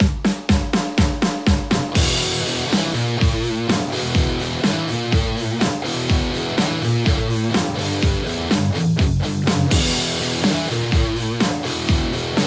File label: Les Paul; tse x50v2